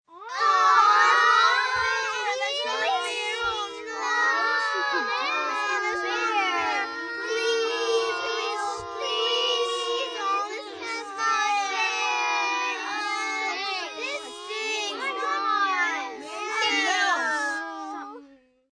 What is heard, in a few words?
Group Whine, Whining, Group, 6-8 children